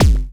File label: Kick, One Shot Drums